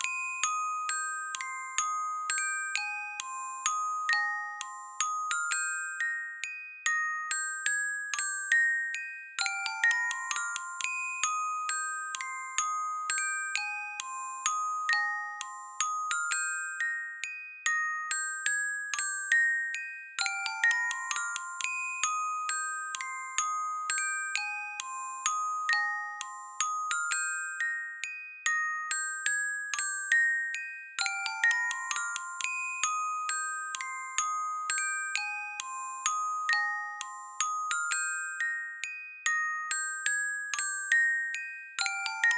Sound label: Music Box; Yono and the Celestial Elephants